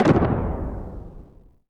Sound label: BIG SHOT, PERCOLATE; Northstar; The Wizard VOL-1